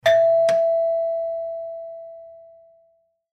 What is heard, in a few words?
Doorbell Ring; Doorbells; Doors; Household; Blastwave